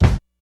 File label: focused kick drum sample a key